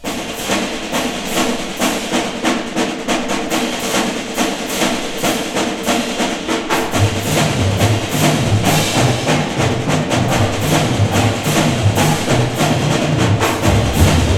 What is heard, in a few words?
drum break; Highlights Of 1976